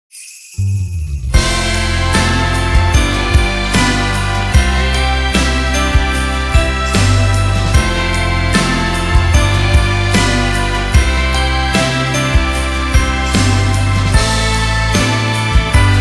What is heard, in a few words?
INSTRUMENTAL